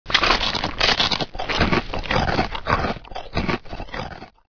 eat food

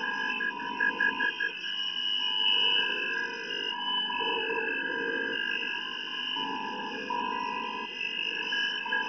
computer loop

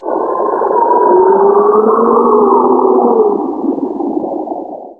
wind moan

